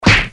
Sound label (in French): Claque; bruitage